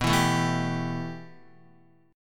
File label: B 7th Suspended 4th Sharp 5th B down Guitar; B7sus4#5 strummed